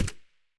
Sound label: scar silencer mono far